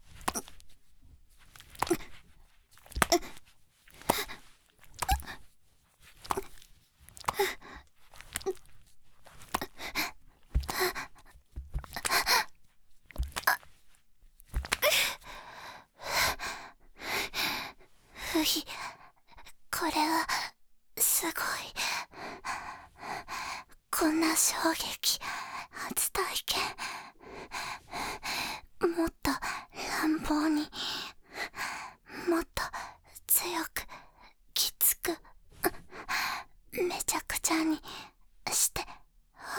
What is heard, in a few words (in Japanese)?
ギシアンループ; 無感情ダウナーヤンデレ少女に弱みを握られて… 第5話; おまけ (第5話のギシアンループバージョン)